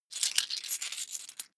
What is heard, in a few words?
coins big clatter